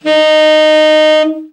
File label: TEN SFT, SAX; Best Service Gigapack 1; BR SAX 3